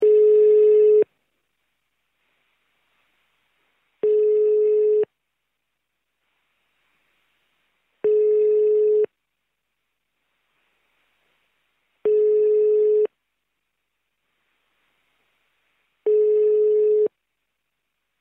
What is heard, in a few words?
dial, smartphone